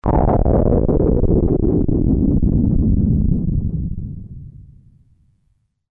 Thunder

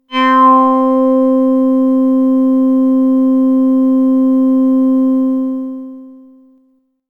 Sun-Sigh C, synthpad

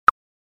Claves

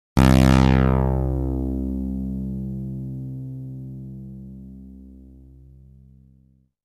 synthlead

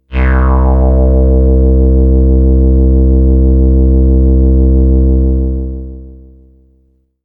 Sun-Sigh A, synthpad

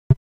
Tristar, synth lead

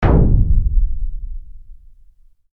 forge

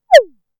Little Bird

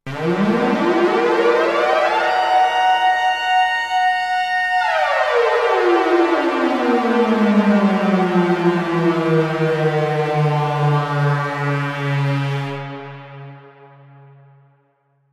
Outer Space Alarm

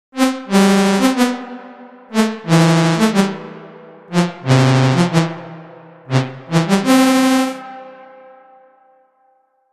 Wide Piece, synthlead

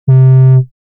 Clarinette; instruments

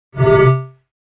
Oberon-Bottle

